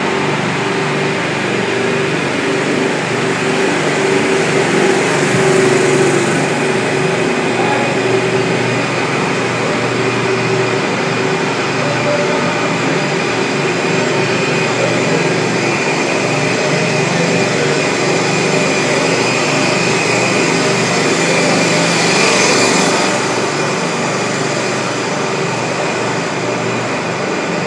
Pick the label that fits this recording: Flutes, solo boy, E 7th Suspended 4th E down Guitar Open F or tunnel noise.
tunnel noise